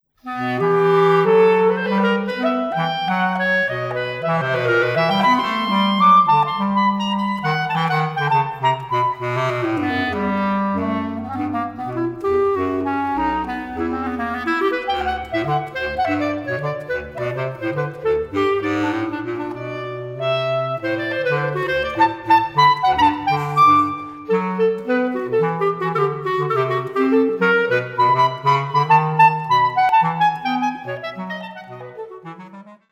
Quintet

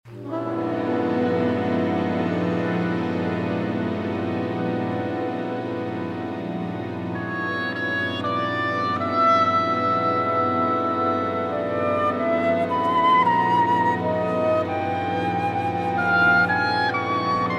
Big Band; Latin

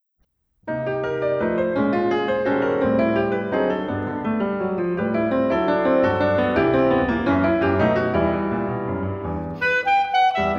Quintet Vals Venezolano

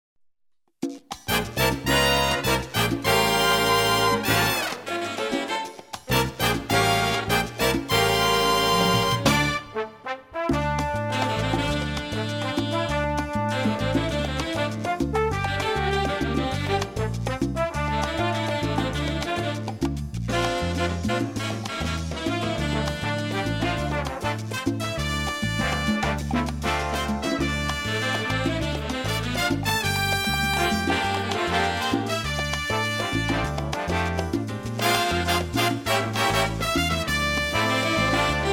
Jazz Orchestra

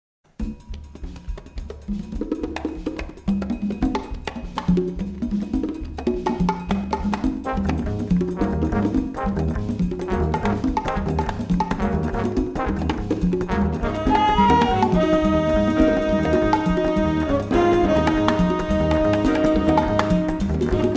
Rumba; Rumba Abierta

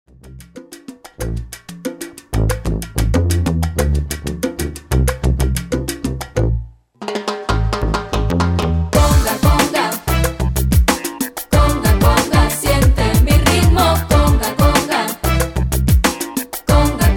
Conga conga

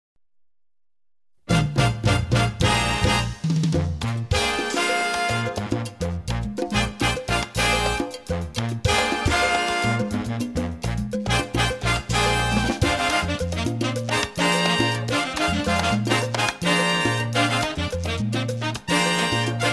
Jazz Orchestra

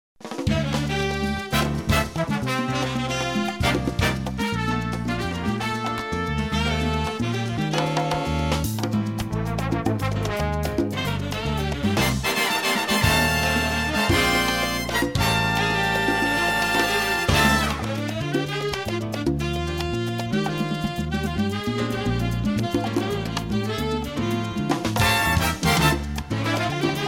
Jazz Orchestra

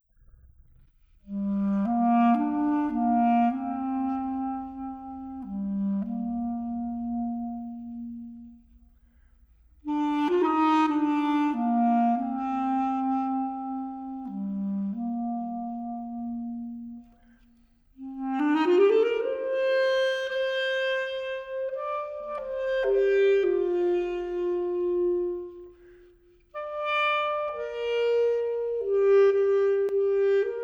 Quintet Afro

